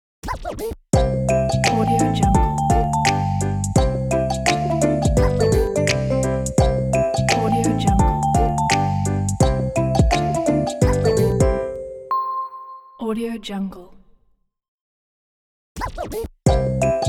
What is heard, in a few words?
happy mallets logo